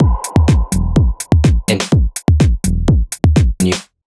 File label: Techno Kit Minimal